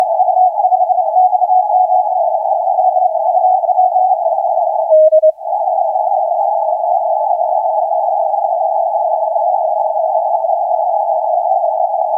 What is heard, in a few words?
15m CW